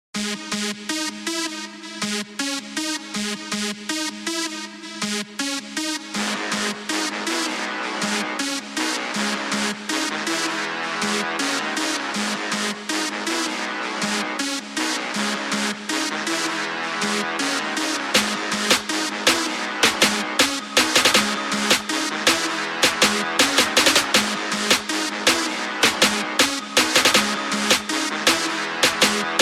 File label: Hip Hop Instrumental